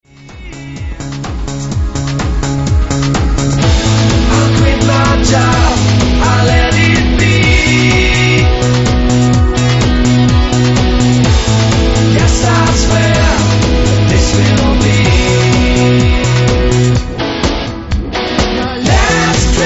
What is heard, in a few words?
karaoke version